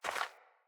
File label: Hare Step; Encounters; Animal Rescue